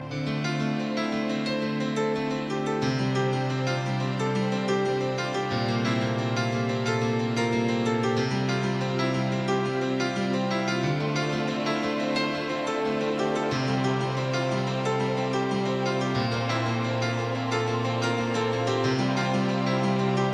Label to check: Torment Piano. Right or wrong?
Right